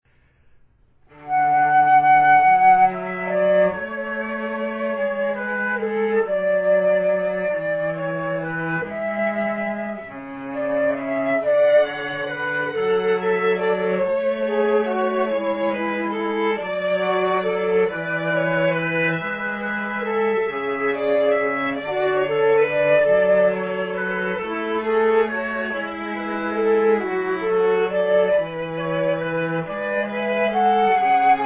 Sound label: Lydian Canon